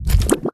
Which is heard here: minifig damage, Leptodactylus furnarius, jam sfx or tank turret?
jam sfx